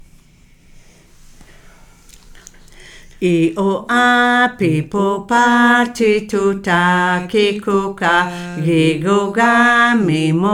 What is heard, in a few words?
Syllabic song i u a; inuktut; inuktut home literacy manual